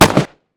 rifle dist; weapons

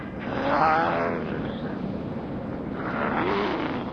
groan, zombie

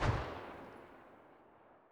mp5 rev; weapons

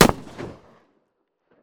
shot dist; weapons

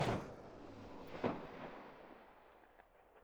ak74 rev; weapons

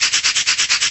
dice loop

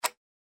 plug out

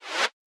Swipe

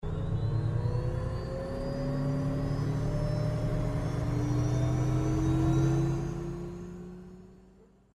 dry start

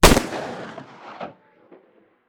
rev dist, weapons

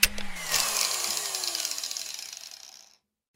sandend

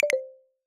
move error

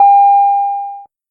belt, toyota; speedo

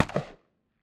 p90 dist; weapons

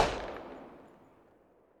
uzi rev; weapons

